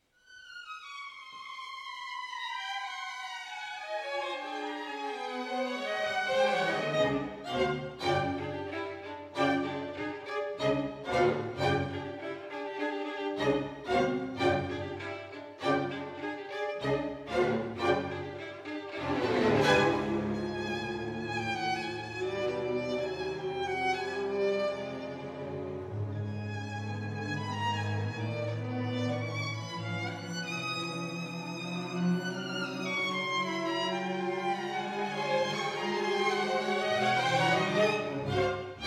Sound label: Partita Gavotte